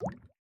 滴水石锥熔岩滴落声

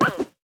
犰狳滚动声

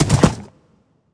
骷髅马水中疾驰声

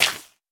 可疑的沙砾放置声

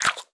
狐狸进食声

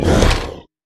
咬声; 劫掠兽

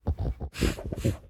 搜寻声; 嗅探兽

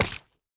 蜂蜜块破坏声

